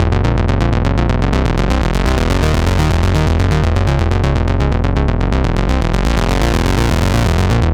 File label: 124 E Bass Loop